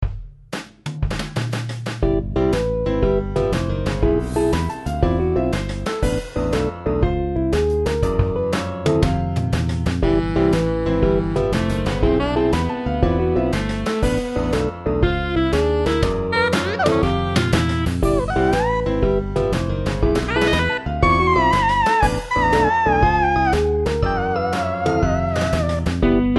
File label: jazz